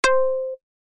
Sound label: first card sound; memory alphabet